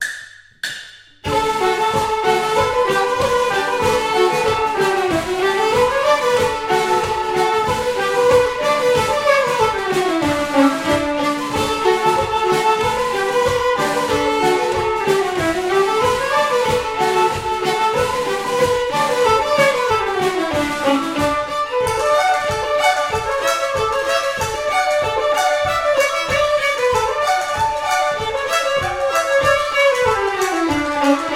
Polka

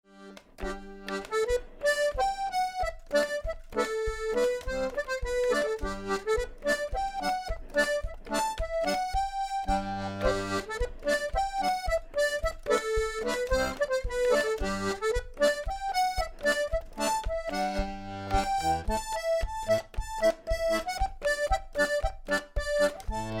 Ballydesmond no, 3 Polka, Irish

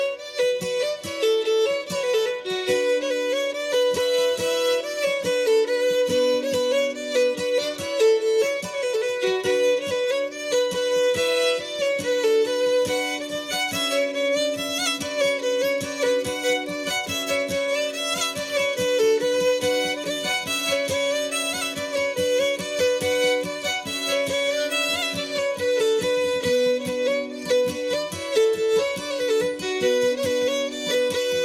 Finnish Polka; Charlie Harris Polka

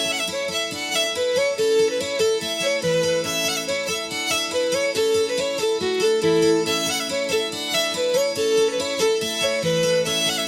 Polka, Irish

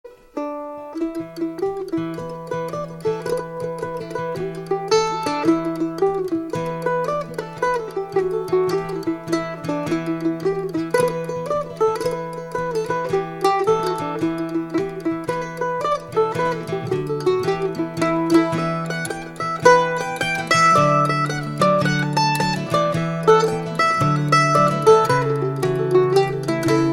jig; Finnegans Players List of Tunes

